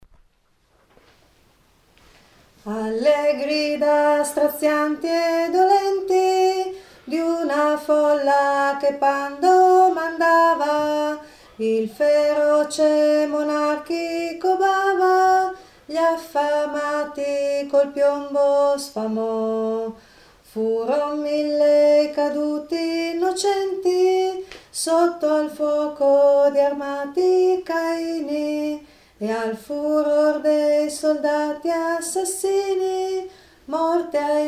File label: contralto